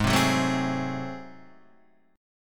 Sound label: Ab 7th Flat 9th Guitar Open D, chord